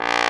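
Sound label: Trombone, Orchestra Brass, Roland; Club-50 - Foundations Roland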